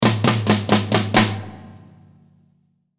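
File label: Musical Element, Drum Snare March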